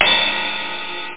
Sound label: RIDE CYMBAL